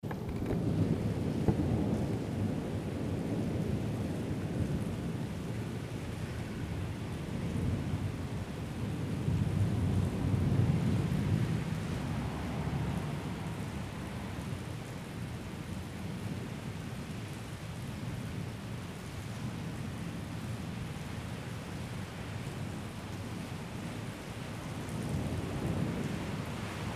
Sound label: Rain and Thunder in Genova at Night